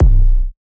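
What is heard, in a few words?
808 one shot C minor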